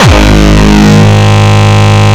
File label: Longkick, kicks